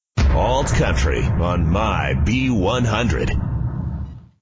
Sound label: alt country